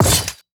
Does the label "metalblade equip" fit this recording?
Yes